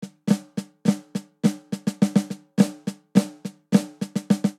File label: Country Snare Only 209 bpm; 4 4; MIDI Clips; Ableton; Factory Packs; Drum Booth